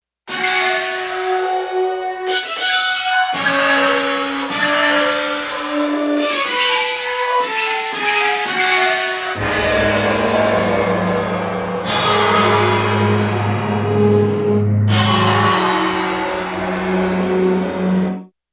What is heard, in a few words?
Gated FX